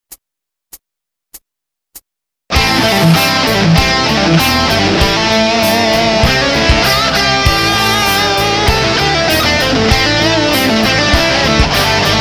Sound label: lick; workshop